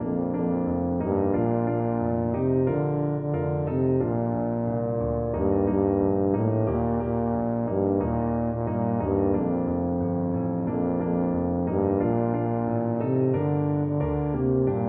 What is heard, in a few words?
do lord, tuba